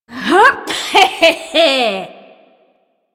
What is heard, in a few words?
Lift Laugh, Corpus Lich; Warframe; Persona D